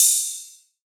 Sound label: Ride, Cymbals; Cymatics; Light Free Sample Pack; Drum One Shots